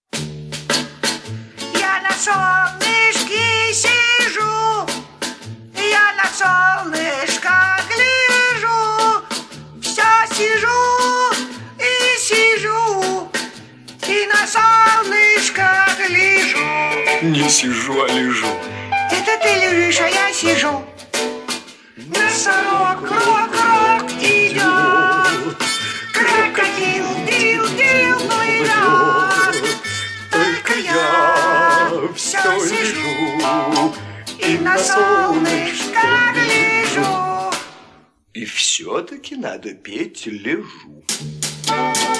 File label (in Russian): ДЕТСКОЕ; ЛЬВЕНКА И ЧЕРЕПАХИ